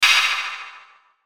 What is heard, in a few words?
Hit Airy Warble; Sound Design